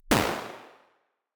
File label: SMALL CHURCH, impulse responses